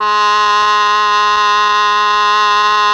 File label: OBOE; ILIO; Propeller Island Legendary M400; BRASS+OBOE